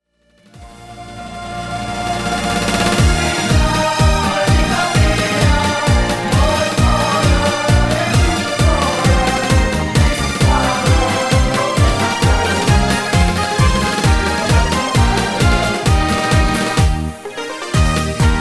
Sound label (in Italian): Ballo Di Gruppo Canto